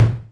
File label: Tom 16 A#2